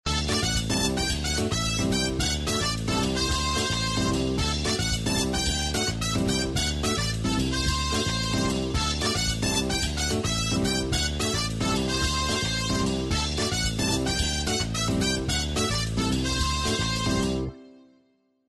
Melody, Ring; Doro Liberto 820 Mini

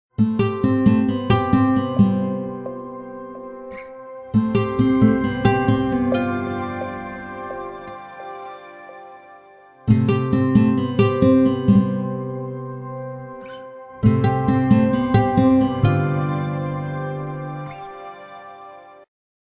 Shimmer, Nokia Tones; Windows Phone apps

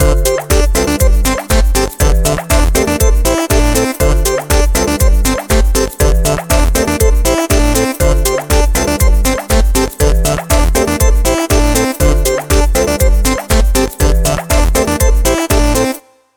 Arcade

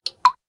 Drop, notifications; Coolpad; C558

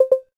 camstart; T390K Wine 3G; LG

